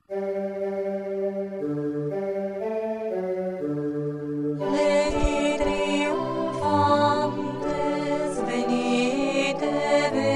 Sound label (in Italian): Adeste fideles - Contralti+Base; Natum de Maria Virgine